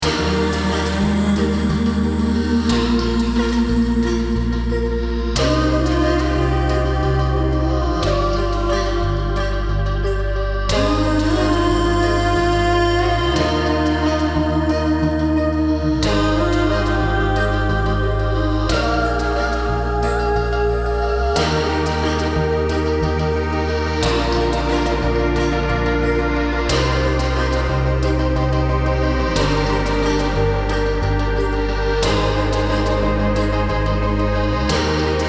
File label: Healing Melody